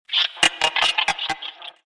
Alien Insect Troopers; Alien Sound Effects; science fiction sound effect

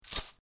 Small Chain Drop; Chain sounds; Metal Sound Effects

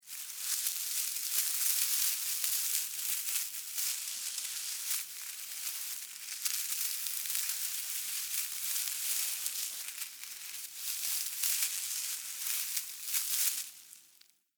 Animal Critter Movement Grass Foliage; SFX Bible 6

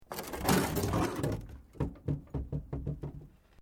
Metal Light Movement Minor; Materials

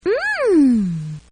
Lady Says MMMMM; Exclamations; Voice

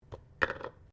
JAR PLASTIC LID PLASTIC DROP BENCH; Various kitchen jars; Drops (Sound Effects:Objects)

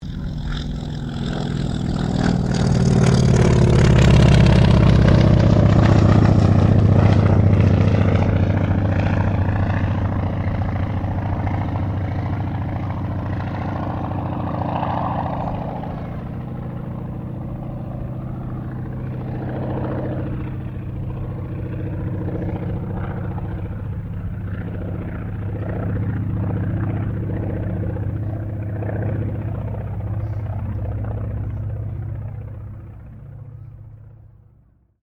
AIRCRAFT CAC WIRRAWAY PASS

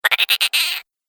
Funny Little Gremlin, monster

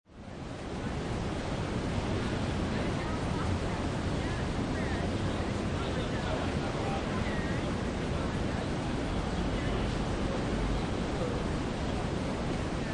City park, Open plaza; City park - NYC, Legion Square close to Chase Manhattan bank. Open plaza somewhat away from car traffic. People talking, eating lunch.; City Sound Effects; Lynne Music

